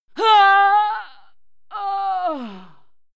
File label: female fight die